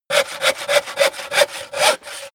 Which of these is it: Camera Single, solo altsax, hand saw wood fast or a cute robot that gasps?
hand saw wood fast